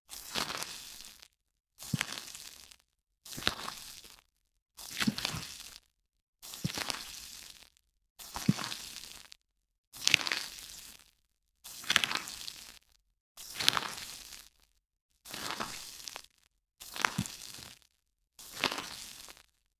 a set of mushroom footste

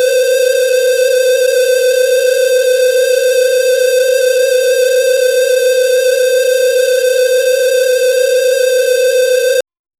loopable low hp warning

